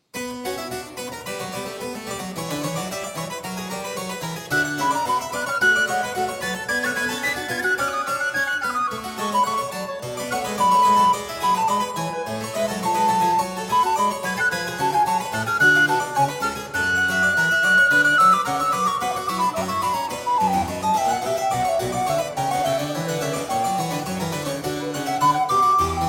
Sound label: Allegro